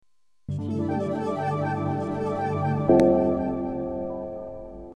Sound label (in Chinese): 电视包装音乐